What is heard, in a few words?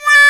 Trpts